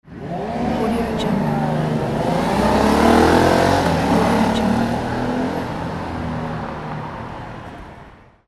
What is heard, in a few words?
motorcycle drive by